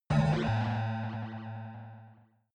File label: protective shield hit absorption buzz